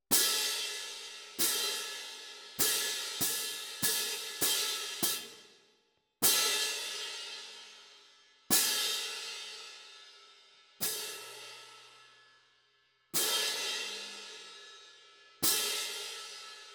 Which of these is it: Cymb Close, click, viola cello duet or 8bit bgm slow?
Cymb Close